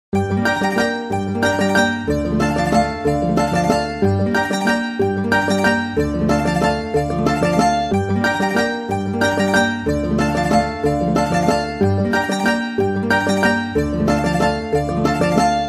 Starting Musik Ringtone

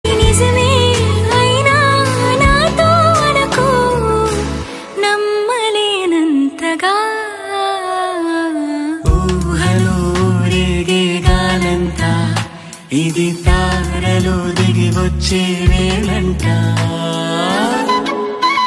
Female Ringtone

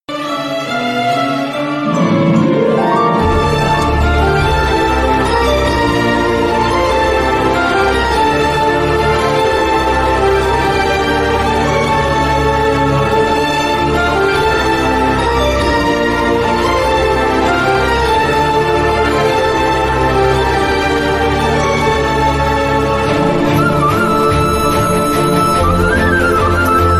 Sad Emotional BGM

